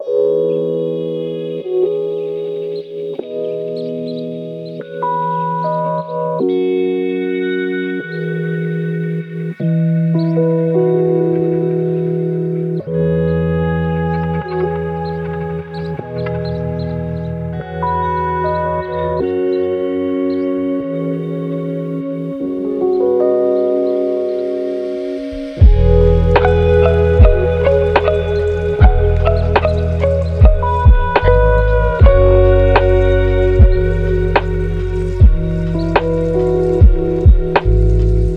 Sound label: lofi hip hop